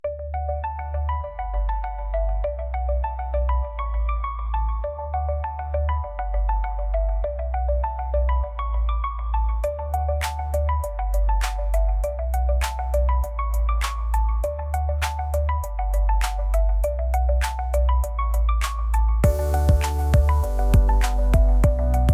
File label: Digital Disco